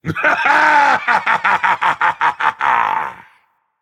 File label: heh heh dragon; fafnir